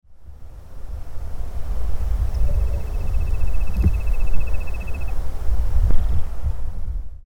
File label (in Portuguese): Nothura maculosa Codorna amarela